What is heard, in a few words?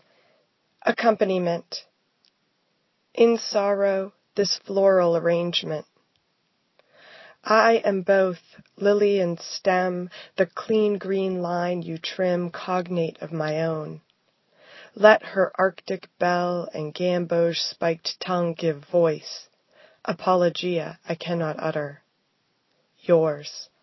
Accompaniment